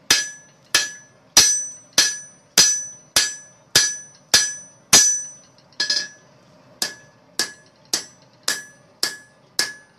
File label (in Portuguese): som de Bigorna